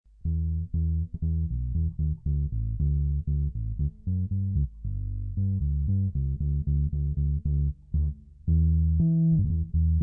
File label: 1972 EB4L, gibson bass